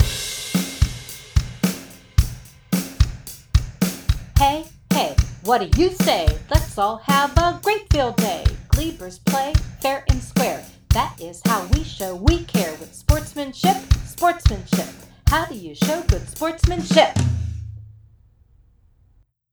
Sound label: Sportsmanship Chant; Glebe